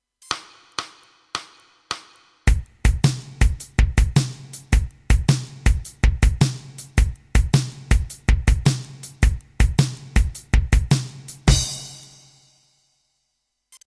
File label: blues shuffle